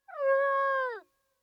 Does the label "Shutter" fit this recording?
No